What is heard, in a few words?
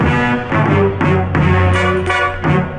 horns, Loops